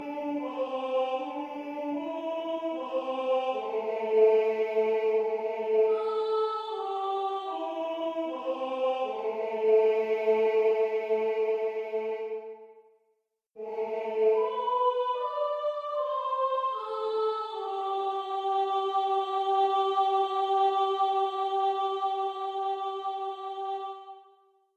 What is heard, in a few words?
barbershop tags